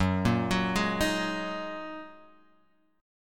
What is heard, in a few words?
A sharp 7th Suspended 4th Sharp 5th F sharp